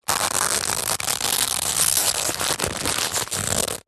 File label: foley plastic stiff packet small handling squeezing